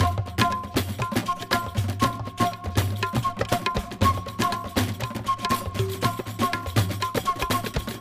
percs; music world

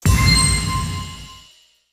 sfx reward unlocked; Rayman Origins

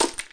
Sound Hit Ice

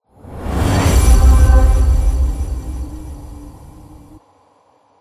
Transition; Everspace 2

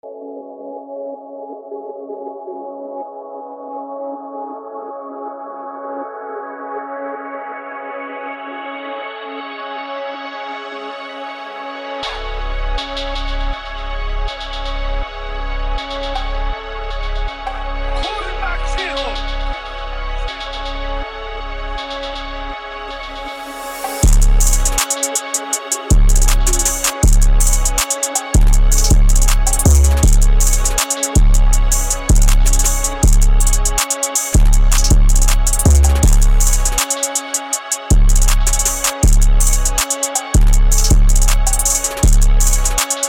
Diegetic Music - Valley (Trap Song); Ready or Not; Supporter OST

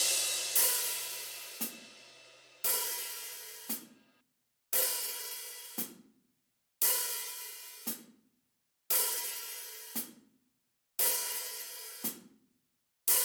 Instrumental Cymbals; nowhere station

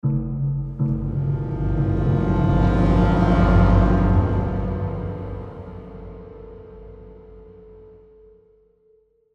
Tension Mood; The Last of Us

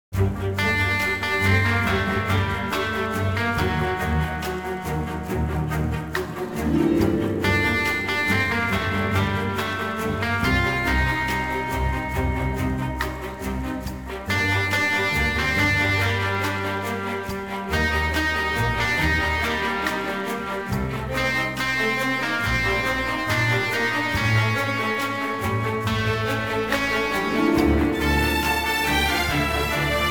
Retro 140BPM 4-4; Evil Genius 2 World Domination; PS4; Xbox Series X/S

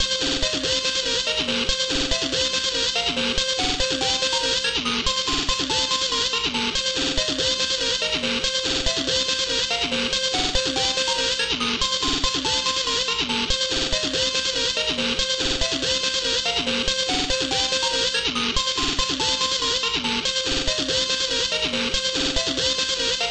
Beat Hi; gamerip; GAUGE

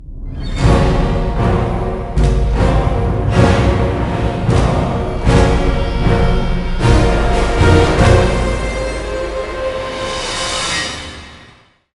church destruction

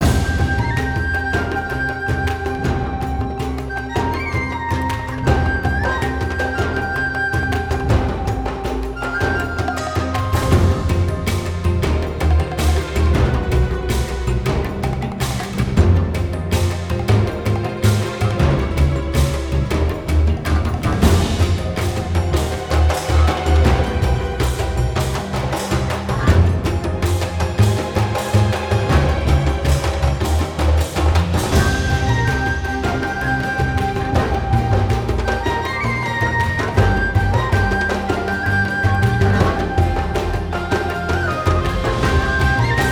Big Combat Loop

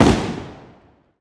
gun shotgun blast shot

